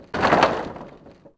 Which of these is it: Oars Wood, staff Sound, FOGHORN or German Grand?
Oars Wood